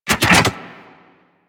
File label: Gas City Mechanism Lock; Ambience; Props; Warframe; Corpus Gas City; Props Remaster